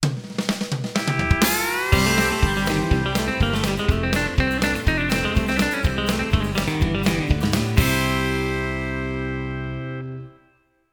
Stinger; Run Away!

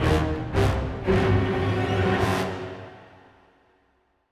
Cinematic Music - Sinister Stinger

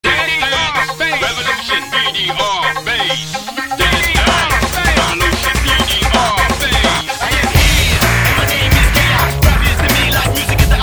Solo Bass Mix; Dance Dance Revolution; DDR

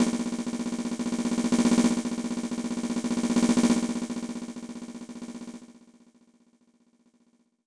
Drumroll; The Magical Quest Starring Mickey Mouse; SNES

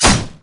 Attack Dagger

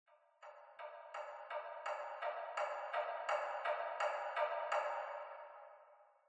Clock Interior; The Sinking City

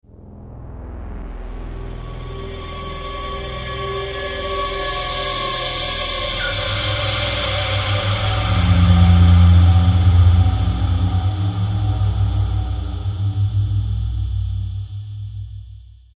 slow fade stinger

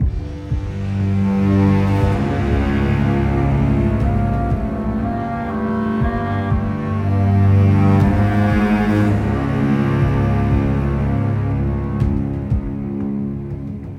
String; Assassin's Creed Unity Dead Kings

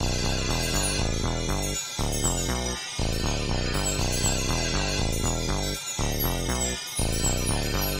Long Hallway - Cartoon

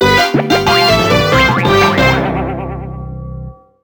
Lose Life; Super Paper Mario

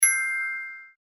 Mail

